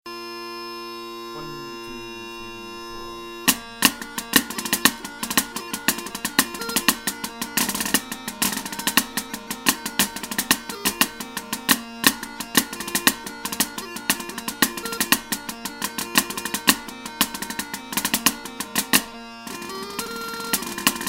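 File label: Donald Camerons Powder Horn Advanced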